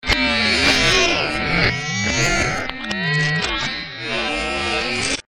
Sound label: funny friend alert; slender; zerosiki